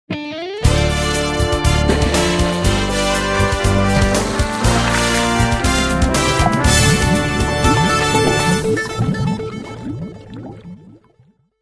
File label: jingle